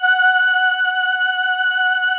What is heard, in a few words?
VP-330, String Machines